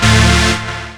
chord A; Techno Trance Essentials; CHORDS

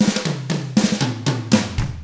ROCK

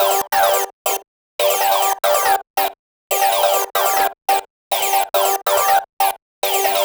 Arp 140bpm G, Trance; Zero-G Trance Inducer; Arps 140bpm; 90 s Sample CDs

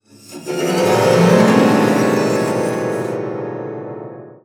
GLISS, SPINET; Xsample VOL-7

